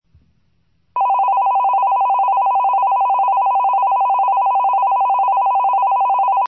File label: tel bel